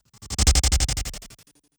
Strobe Transition

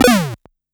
Game Sound

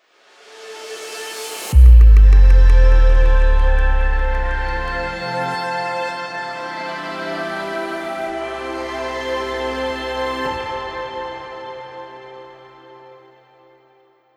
Corporate Transition

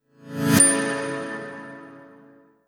Simple Transition

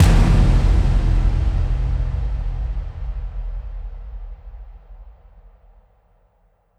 Impact

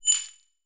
Slider

